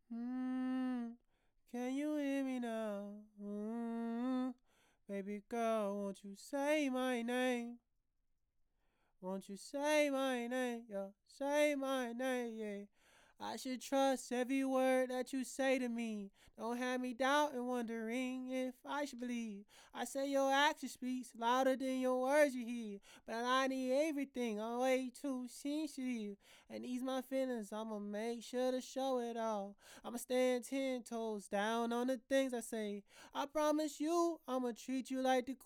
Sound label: RnB 143 Fm, Acapella